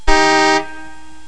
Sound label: car